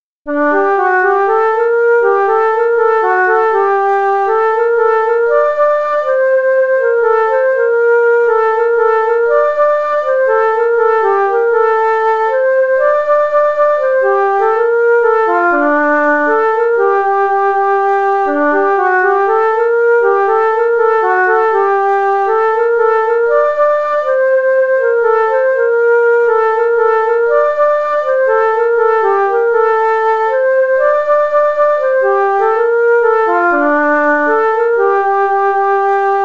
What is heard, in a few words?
nyckelharpa; 7GanglatDalarna